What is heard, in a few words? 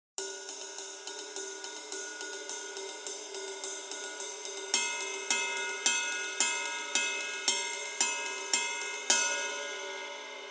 Troy Ride 20 Rock